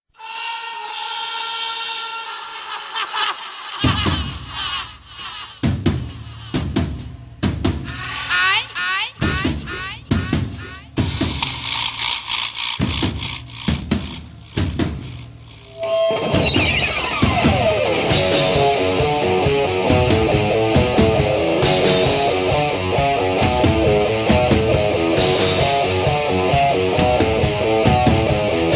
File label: Instrumental